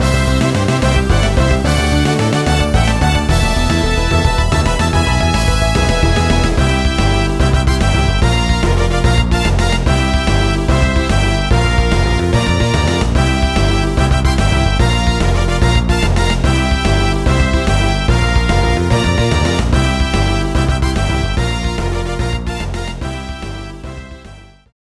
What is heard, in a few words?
Victory!; Golden Sun; fan arrange 2007